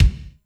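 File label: room bass drum, acoustic drum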